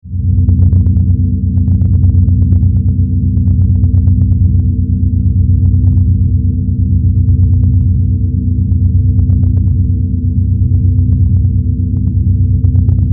Drone, Flutter, Energy, Pulse, Scifi; Deep, Science Fiction, 5.1

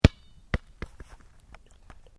Sport Soccer Bounce Concrete, Soccer ball sounds

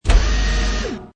Hi Tech Panel Servo Button

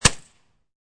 Suppressed Pistol Amphibian Field; Suppressed Pistol Amphibian Field Fire; Pistols; Guns; VRSonic

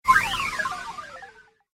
Fast Droid Flyby

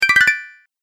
Bonus Collect, video game sound effects

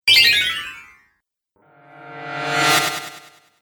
Magic Spells; Magic Sound Effects

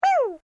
Created Creature Small Call, Alien Sound Effects

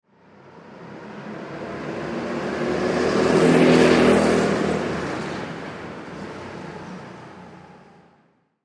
Go Kart Pass By Left To Right Fast; Go-Carts; Vehicles; Sports; Blastwave

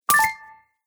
Loot Coin, video game

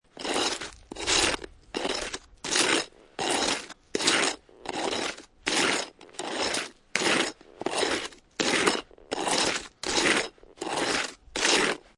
Snow And Ice Many Ice Slides; Weather

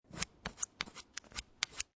Billiard Pool Snooker Apply Chalk To Tip Of Cue; Pool Sound Effects; Pool (Sound Effects:Sports)

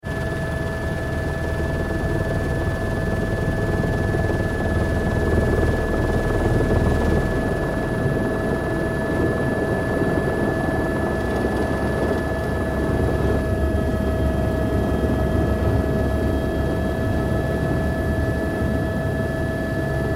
Helicopter Flying Turns, Helicopter in flight; Aircraft sounds

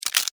Insect, Eat, Chew, Bite, Bug; Animals:Insects; Insects Eating; Blastwave

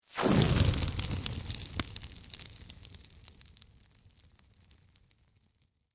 Sweep Organic Medium

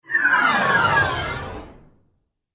Science Fiction Spaceship Slowing Down Transition

